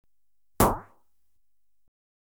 Pump Action Rifle (Single Shot), Electronically Generated Sounds; BBC Sound Effects Library